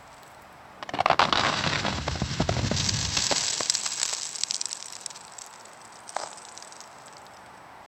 a heavy clay tablet being

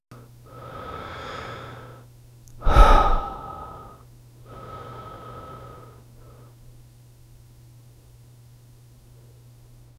realistic gentle human br; intimate

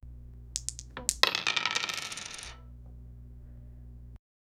dice rolling on a, tabletop

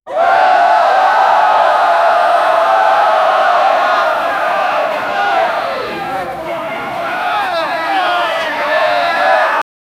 angry crowd people shouti; anger

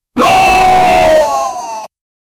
battle shout; humanoid

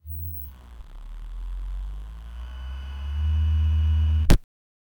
switching on an electrica; windows startup

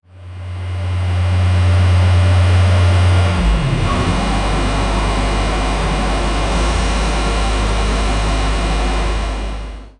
electrical wind up swoosh, locomotive

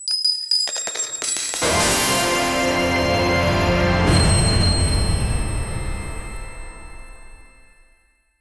victory sound for knight, magical shimmer

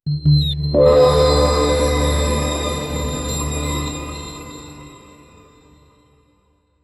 magically appear; appearance

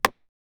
wood axe chopping into oa; tool

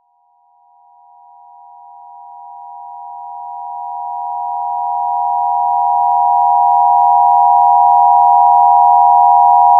ai generating text

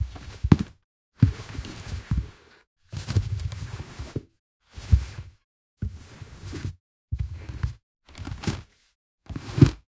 realistic sfx of multiple, room tone

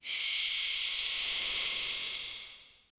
snake hissing; reptiles

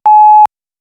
a short 0.5 second ui erro, error

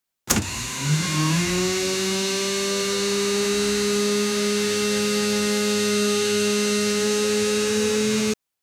high rpm electric supercar

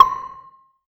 a fast paced high pitched, bounce

